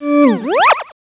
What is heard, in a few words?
flag drop